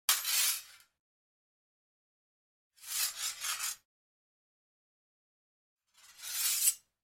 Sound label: Foil Sword Rub; Hollywood Edge Martial Arts and Human Impacts